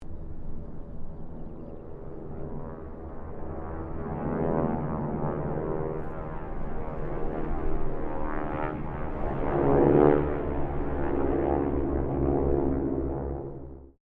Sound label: Harvard squadron 4 pass distance, stereo; Mixed aircraft squadrons; Airplanes; Vehicles